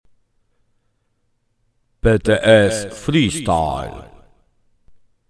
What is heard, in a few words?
Freestyle jingle; Puchar Saunamistrzów w stylu wolnym Polskiego Towarzystwa Saunowego